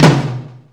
DRM G#, YAMAHA R5+RX; Best Service ProSamples vol.55 - Retro Sampler; AKAI

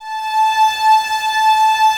Violas No Vib; String Ensembles; Miroslav Vitous

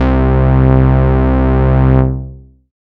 Bass Note C

